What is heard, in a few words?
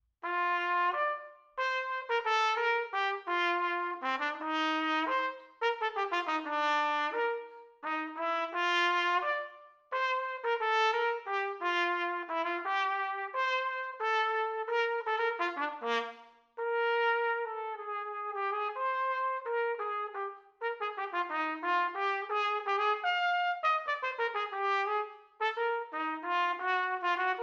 Hemiola Waltz